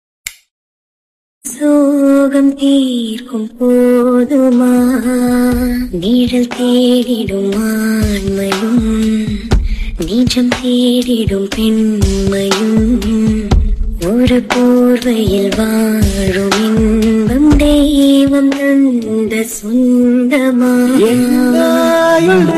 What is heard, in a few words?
song ringtone, Tamil song bgm; Mayakam Enna